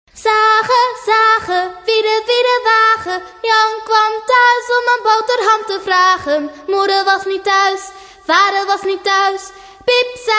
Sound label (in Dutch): zagen; Zagen zagen wiedewiedewagen